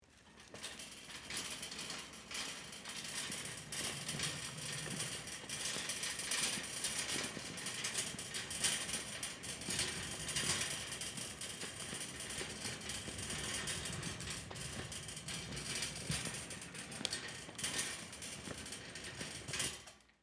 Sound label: Shopping Cart Push, Long; Stores and Malls